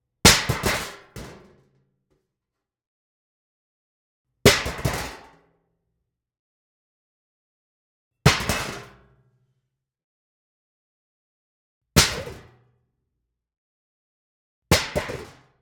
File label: MUG Metal TV Tray Drops Series; Griffin Sound Design; Mugs